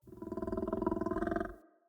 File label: Wolf Idle Grunt; Animals; Vocals